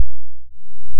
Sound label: sawtooth f1 h64